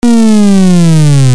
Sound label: ALARMS